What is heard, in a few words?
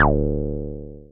Synths BASS